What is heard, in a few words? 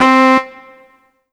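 Yamaha TG100 Charang Lead C4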